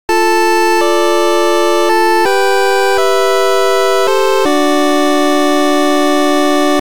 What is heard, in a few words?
8bit